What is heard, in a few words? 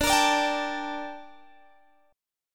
Bb Major 13th D